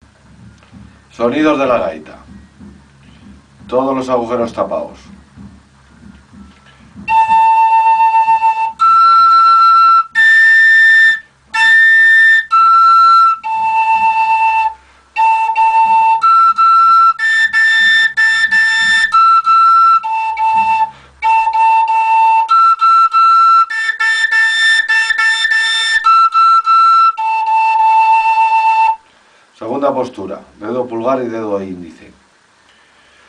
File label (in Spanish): Sonidos de la gaita charra; Charradas